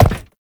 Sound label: Step Glass, Movement; Uriel; Warframe